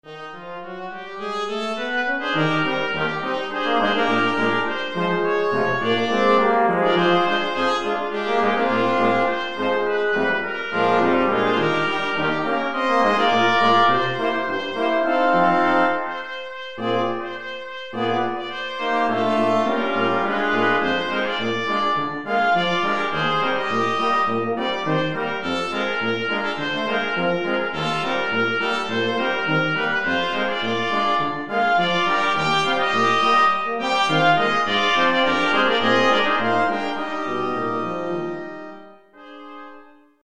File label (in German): blechblasquintett